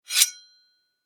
Warfare Knife Scrape Blade; Knives (Sound Effects:Combat)

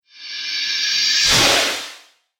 Cinematic Strike Vampire, trailer sound effects

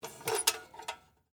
Lantern Pick Up; Foley; Pick Ups; BlastWave

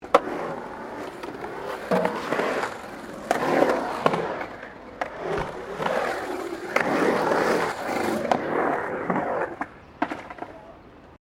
Skateboard Skate Bowl Round Bowl; Skateboard sounds; Sports; Skateboarding

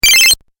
8 Bit Style Bonus Win; Video Game Sound Effects

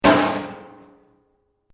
METAL BOX; Metal (Sound Effects:Materials)

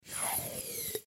Air Pump Push Lever Down Slow; Foley; Blastwave

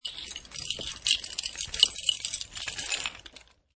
Key Jingle; Ring of metallic keys being handled, jingling

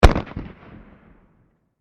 Explosion Firework Close; Fireworks; Backgrounds

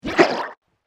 Alien Guard Shout; Alien Sound Effects; science fiction sound effect

